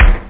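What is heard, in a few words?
techno kick; OctaMED SoundStudio V1; jazzies samples; perc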